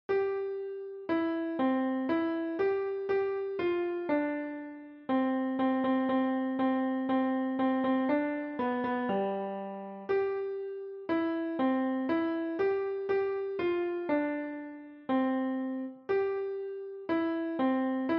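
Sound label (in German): Auf einem Baum ein Kuckuck sass, Klavier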